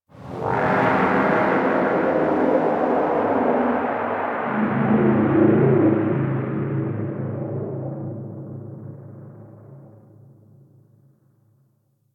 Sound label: Deep Abyss Hit, Exterior; Ambience; Warframe; Railjack